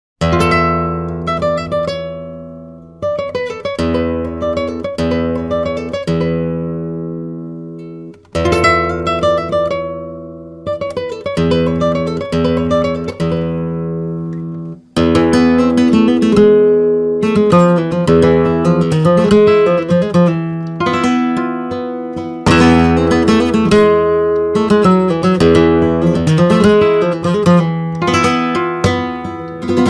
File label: Sound impressio flamenco, guitar; build in 2016 using the finest tonewoods available; Francisco Sánchez Flamenco 2016